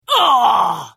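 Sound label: Hit, Khajiit female; The Elder Scrolls III - Morrowind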